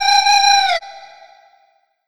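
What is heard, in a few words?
CH FLUTE, Chinese flute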